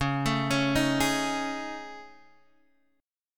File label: A Major Sharp 11th C sharp